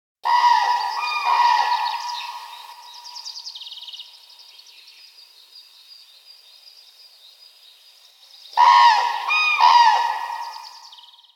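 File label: common crane voice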